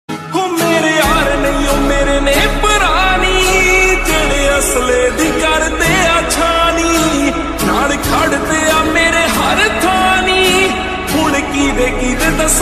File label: lofi ringtone